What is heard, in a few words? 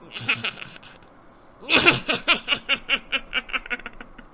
evil laugh